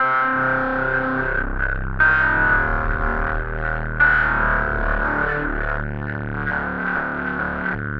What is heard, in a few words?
Rotor Ring, Synth Textures; Sonic Foundry (Sony Creative Software); The Electronic Music Manuscript Collection